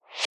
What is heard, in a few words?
whosh